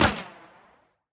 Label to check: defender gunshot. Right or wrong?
Right